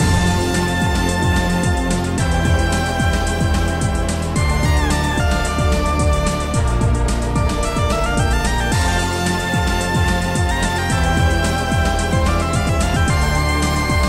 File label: Wind; Roblox; Fjord